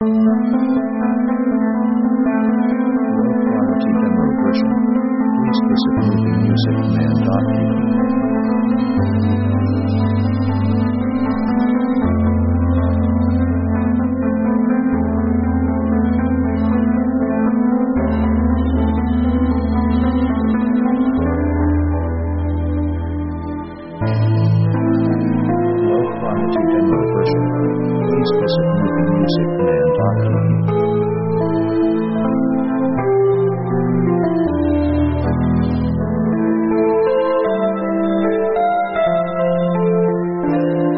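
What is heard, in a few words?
synth orchestra 70s dramatic; synth themes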